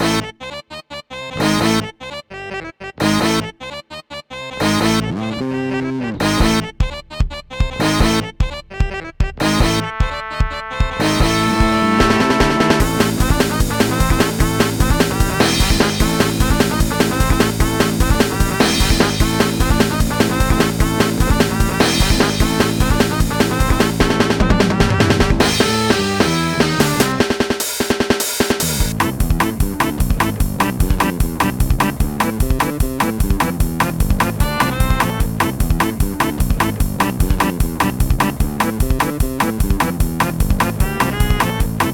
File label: Mock Ska loop